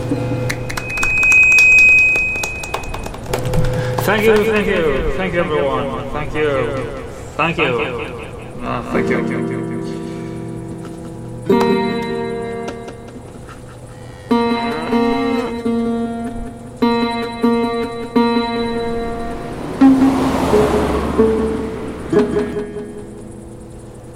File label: Jingle Bells Live, saxmas